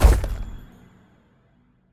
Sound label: Level Editor Object Place Stone